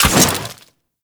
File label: Quill Dart Gun Mech; Warframe